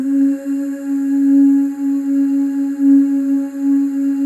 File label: FEM OOS, Symphony of Voices; Spectrasonics; FEM.2 OOS